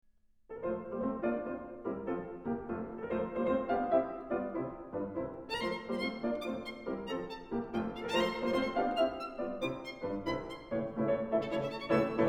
Violin Sonata No. 5 in F Op. 24 'Spring' III. Scherzo Allegro Molto; Ludwig van Beethoven